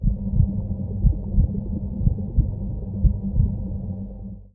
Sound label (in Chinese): 潮涌核心环境声; ambient